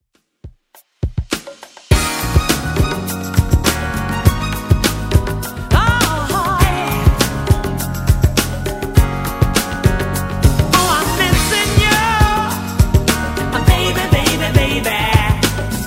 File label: 90s redrum 102 bpm